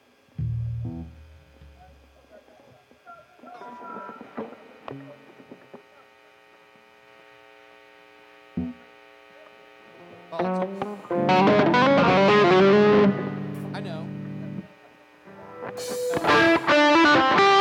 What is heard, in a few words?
Strings